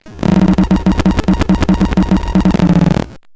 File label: grind, EMU XL-7